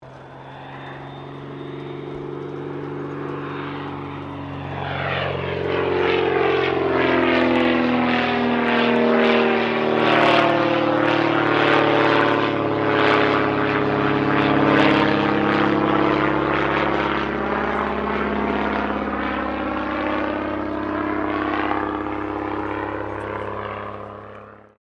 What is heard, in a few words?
AIRCRAFT CESSNA O-1 BIRDDOG PASS; Cessna Birddog spotter aircraft; Airplanes (Sound Effects:Vehicles)